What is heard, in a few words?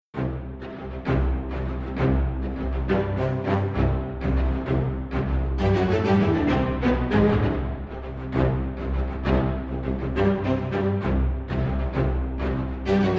Strings Only